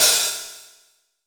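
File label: Standard Open Hi Hat, Roland BK-5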